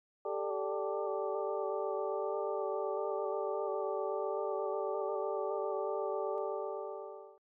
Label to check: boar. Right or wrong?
Right